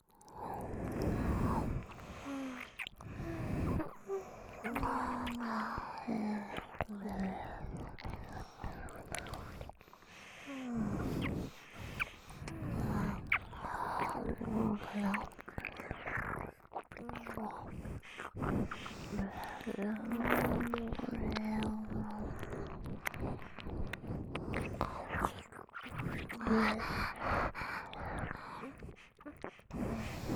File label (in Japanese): ダブル鼻息耳舐め; 番外編